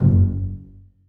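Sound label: basses pizzicato f#3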